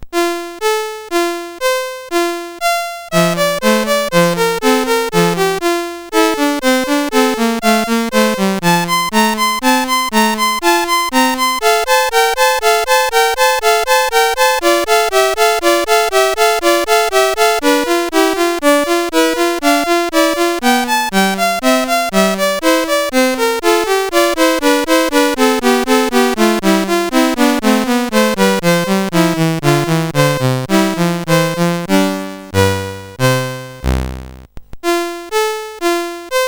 Invention, CSG8580R5; Bach Invention 8 BASIC

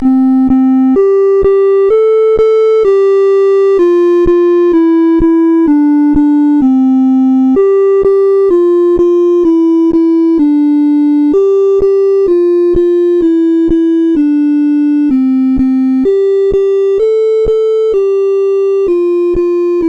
sid CSG8580R5

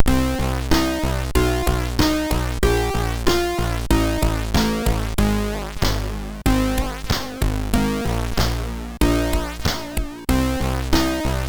Lights On, MOS6581R3

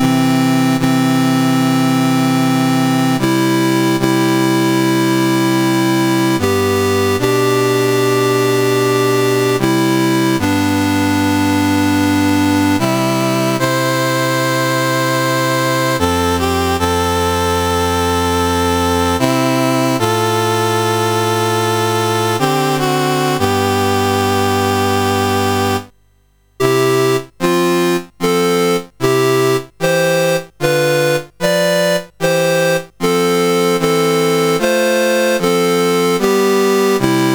Taiwan, National Anthems, CSG 8580; CSG8580R5

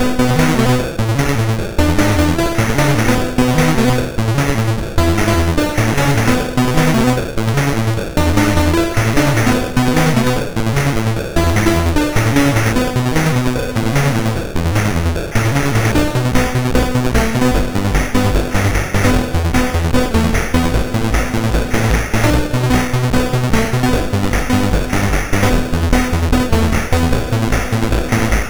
Commodore 64 Music, MOS6581R2

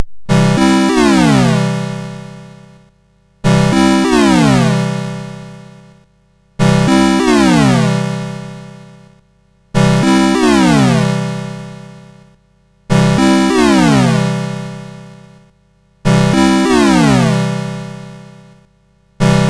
MOS6581R3, sid; Omidar

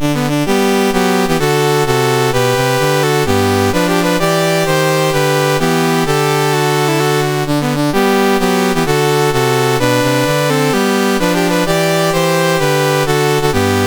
Andorra National Anthems, MOS6581R4

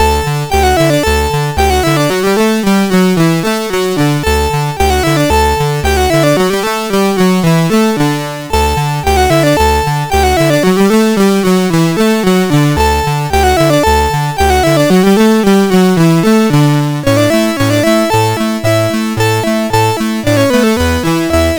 Musette in D, MOS6581R4

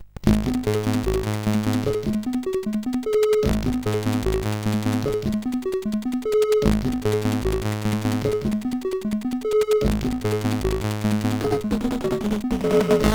intro, CSG8580R5; Zamzara V1 1; hvsc

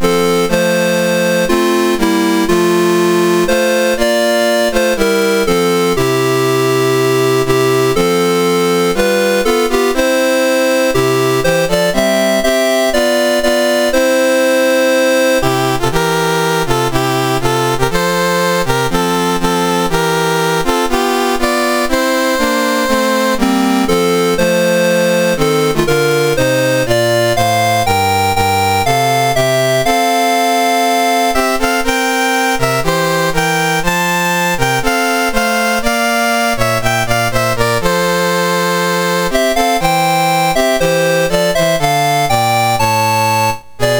MOS6581R2, National Anthems; Nauru

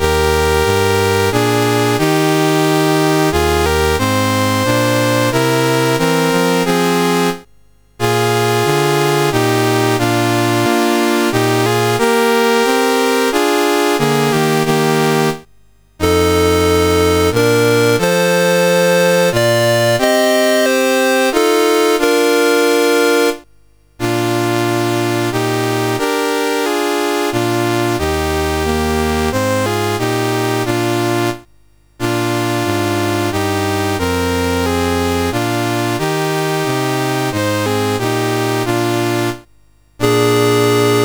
Austria National Anthems, CSG8580R5

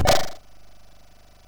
sid MOS6581R3; Toobin

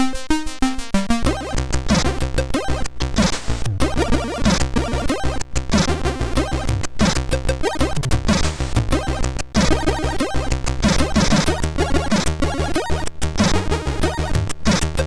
sid MOS6581R2

